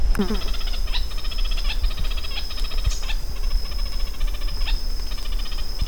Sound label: Synallaxis ruficapillus high intensity